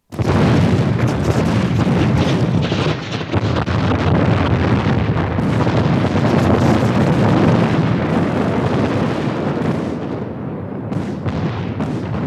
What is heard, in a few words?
Engine Sound